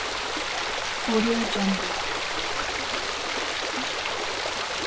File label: large fountain on old italian market loop; urban sounds